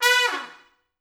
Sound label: Pop Brass, B3; Best Service; ProSamples vol.19; AKAI